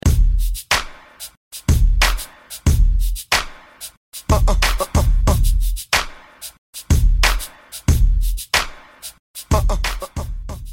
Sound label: Club Hype; Mainstream VIP; Vegas Edit